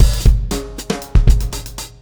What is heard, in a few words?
Beat, Drums, Eclectic Electronica